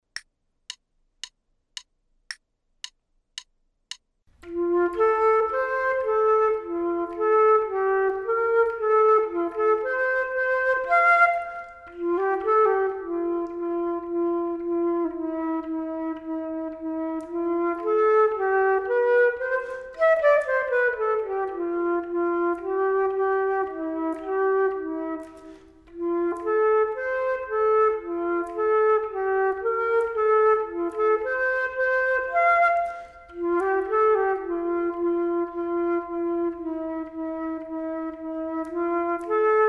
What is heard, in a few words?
Duets flute; Selected Duets for Flute Podcast